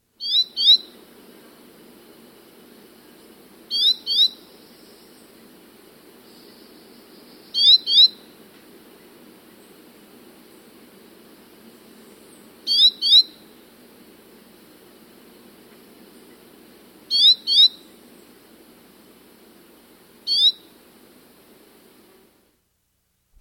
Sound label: Tawny-Capped Euphonia; S. America